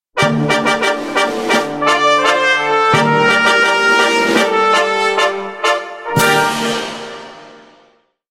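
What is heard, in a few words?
fanfare and solemn award ceremony sounds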